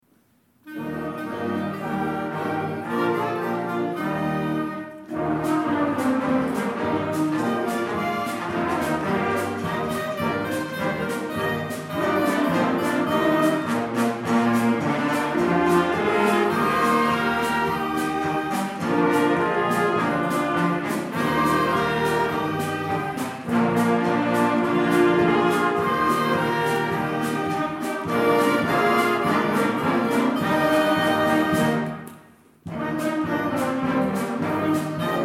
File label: Happy Polka